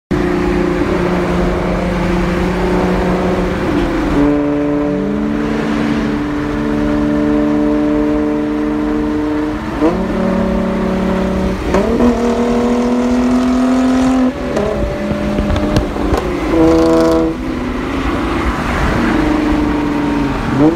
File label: Genesis coupe